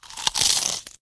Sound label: Zombie Bite